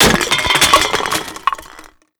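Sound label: destruction trash debris; Explosions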